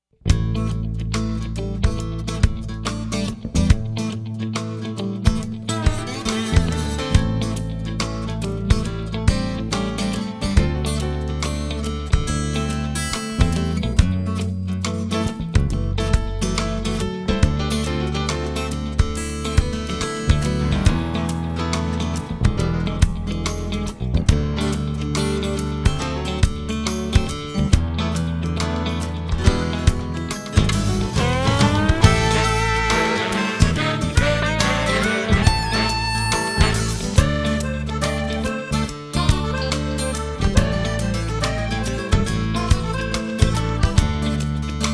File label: Great Guitars